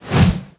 swing whoosh

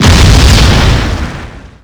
explosion

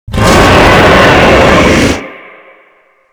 shout boss; thor

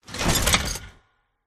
movement foley; gow; silverback

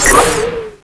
white transform; cso; trinity

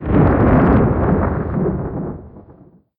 lightning storm rumble

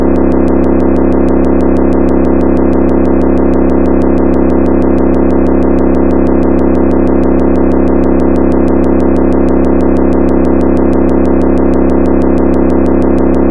mazda rx7, vehicles

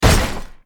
down hiza

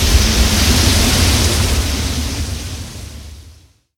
wind buff

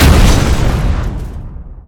down; gundyr

